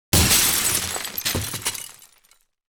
glass large safety; Asian Show Sound Effects

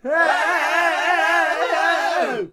Dine group vox

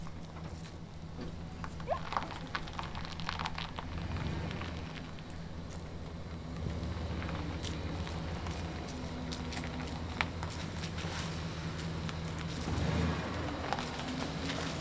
driveway

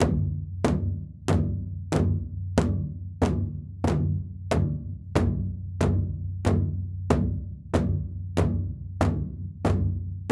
Big Low Drums Mix; Nightfall (Tabbyewik)

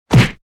punch body hit; fights bodyfalls; Asian Show Sound Effects

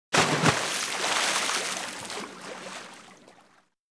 large splash; Splashes and Water; Butterfinger Video Sound Effects

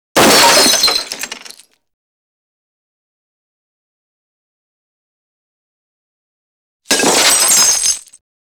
glass med thick; Asian Show Sound Effects

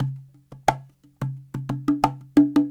Cahon

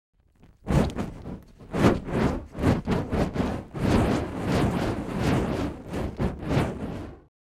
fire flame woosh; Asian Show Sound Effects

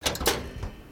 Trunk Unlatch

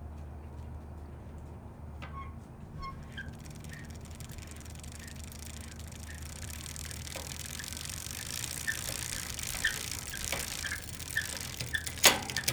Gearshiftin'... Squeakin'

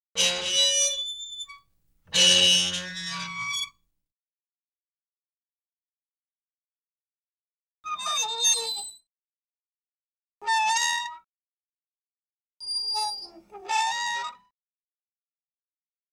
metal hinge squeaks, foley effects; Asian Show Sound Effects

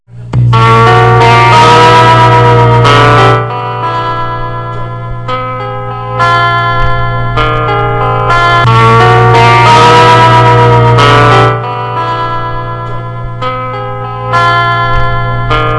annoying tune

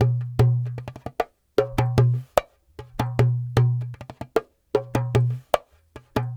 Dumbek

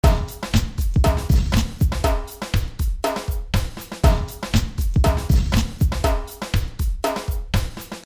drumloop

